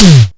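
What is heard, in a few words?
Tom Kit high tom; classic machines; SCI Tom Kit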